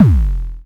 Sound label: hardcore kick